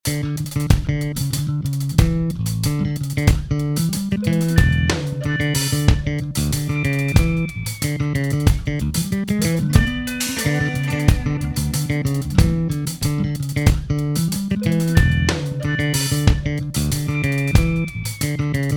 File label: Funk Blues; Trilian